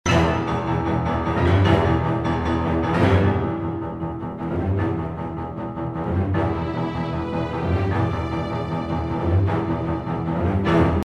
orchestration doublings piano strings, Strings + piano; epic orchestral track